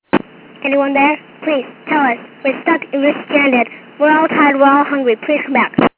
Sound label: Fake Child Distress Call